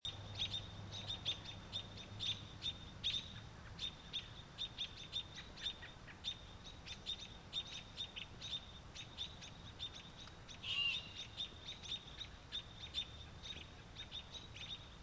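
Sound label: owl perched on neighbor s roof; parent's neighor's roof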